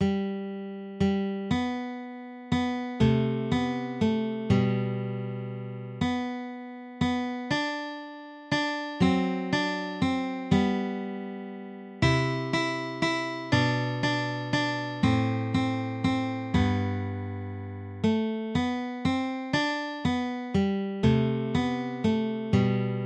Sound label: guitar